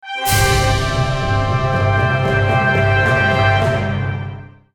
freespin init; Flying Dutchman

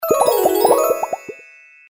transform; Bubble Double

